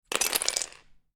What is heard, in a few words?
Lego Few Pieces Drop On Floor; Miscellaneous Toy Sound Effects